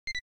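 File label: autofocus failure; LG VELVET 2 Pro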